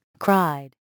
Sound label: cried